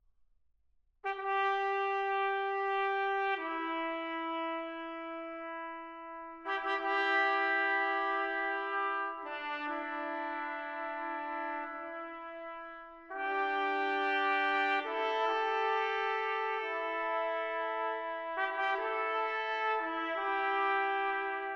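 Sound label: Fanfare, Low Brass; American Visions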